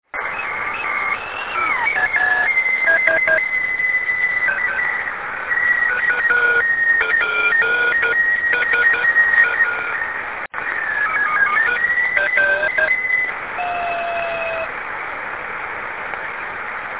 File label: ITUPSAT BEACON; ITUPSAT-1; NA5B